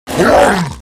creature orc attack

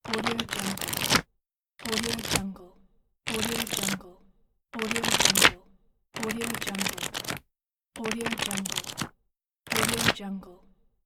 the book pages flipping

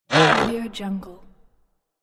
game character creature voice demon scream

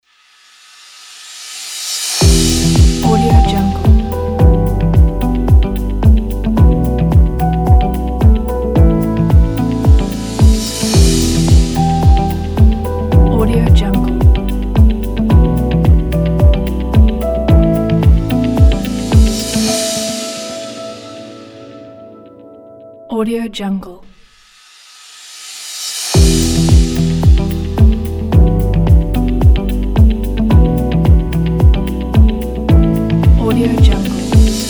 lounge logo

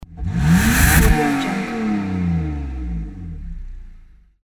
car revving

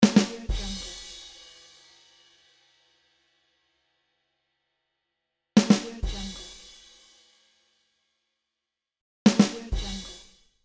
badum tss

